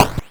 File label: Crushed Perc, bitcrushed; musicradar